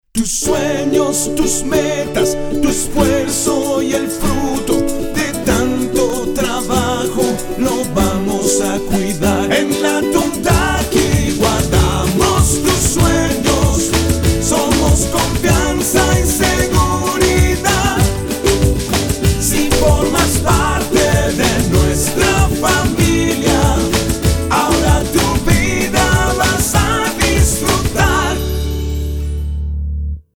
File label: JINGLE; ATUNTAQUI